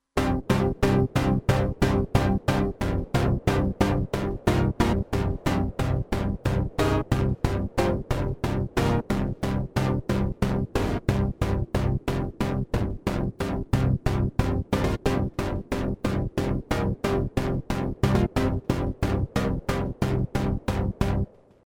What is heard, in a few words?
Electro Hit